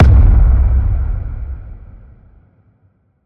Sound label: Hard Hit Hard Landing Boom